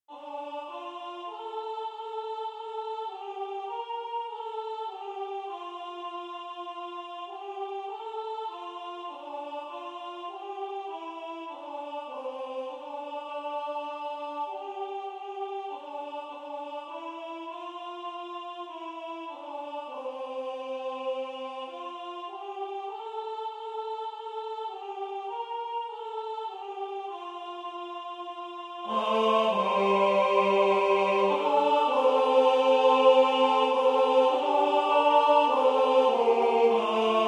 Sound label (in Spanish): Veni veni Emmanuel Estribillo a 2 voces F TENOR destacado; ADVIENTO; Adventus